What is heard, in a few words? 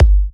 G, Kicks; markus hakala